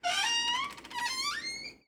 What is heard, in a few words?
Door Creak Pantry; household